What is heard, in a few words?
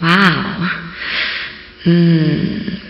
"ah mmm"; Voice; ooh ahh